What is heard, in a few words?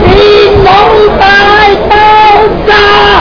computer explosion